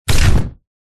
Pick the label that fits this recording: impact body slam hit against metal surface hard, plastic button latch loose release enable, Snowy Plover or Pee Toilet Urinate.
impact body slam hit against metal surface hard